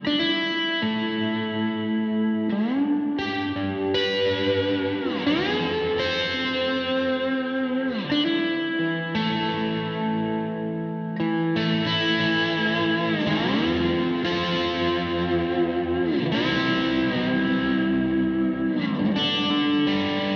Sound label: Lapsteel akg bx15; Nevo